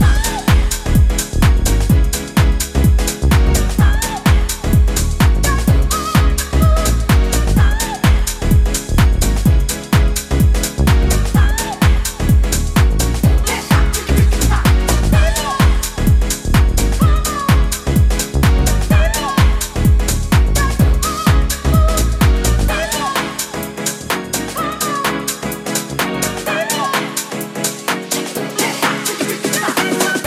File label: Piano Mix